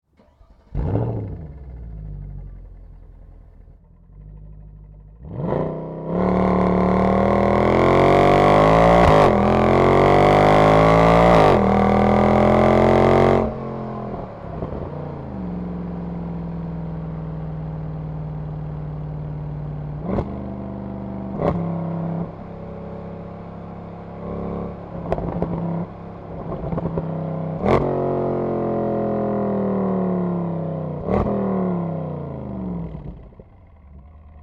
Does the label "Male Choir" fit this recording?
No